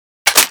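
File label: magazine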